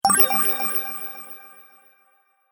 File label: Sparkle Idea, magic sound effects